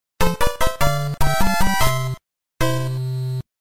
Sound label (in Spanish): Música de Nivel Completo; Super Mario Bros. 3